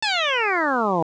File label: A4 fall